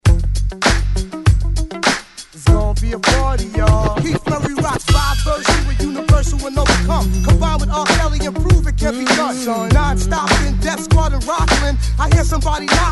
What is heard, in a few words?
90s 95 Bpm; DJDOLLS; Home Alone